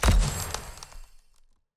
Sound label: Chest Vanish; TANK; 3Souls